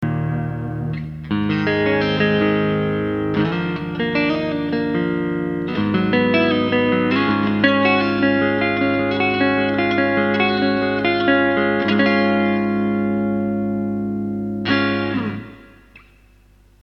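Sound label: BOSS RV-5 Digital Reverb, ROOM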